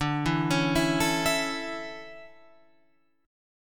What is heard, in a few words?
D Major 7th Suspended 2nd, Guitar Open F; arpeggiated; JGuitar